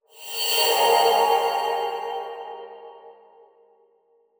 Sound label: Stone; The Deep Paths Labyrinth of Andokost